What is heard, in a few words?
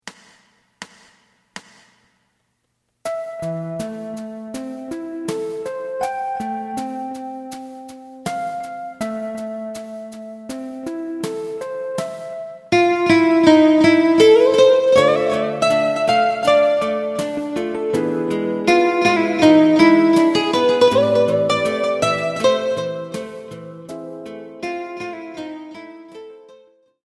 bass